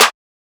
Snare Ruff, drums

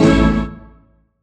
Stab Orchestra Hit Vinyl; One Shots; Ableton Live 12 Lite; Core Library

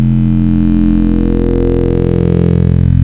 Retro Wind Synth; Ableton Live 12 Lite; Vector; Sprites

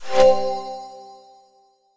menu open

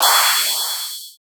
Crash Flanger Sweep, Cymbal, Drums; One Shots; Ableton Live 12 Lite; Core Library

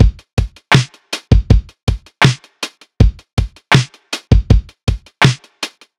Slow Hop 80 bpm, Drums Full Loops; Ableton Live 12 Lite; Core Library

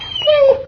whine, dog; There; ThereClient

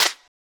Clap Blap, Drums; One Shots; Ableton Live 12 Lite; Core Library

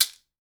Shake Maracas Hard; Shaker; Drums

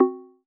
error

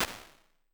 Early Reflections Locker, ImpulseResponses; Ableton Live 12 Lite; Hybrid

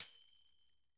Tambourine Hit Soft; Drums

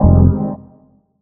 Synth Phaser Fmin7

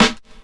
Snare Gated Verb, drums

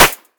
Snare; Ableton Live 12 Lite Core Library

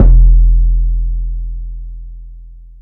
Kick; Ableton Live 12 Lite Core Library

